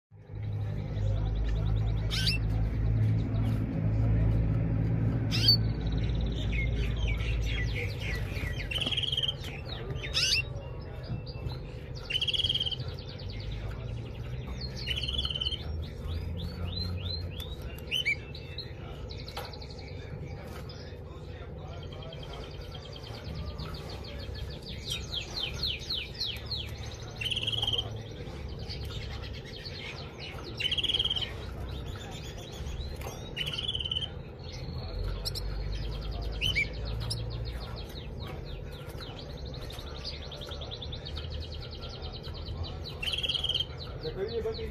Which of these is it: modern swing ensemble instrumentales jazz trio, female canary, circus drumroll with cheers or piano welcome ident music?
female canary